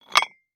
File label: Weight Sliding On Metal Weight Lifting Bar; Sports